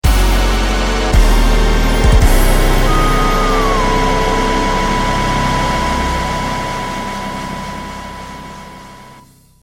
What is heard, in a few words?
game over boss, Music; Manamon 2